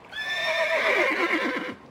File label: Animal Call Horse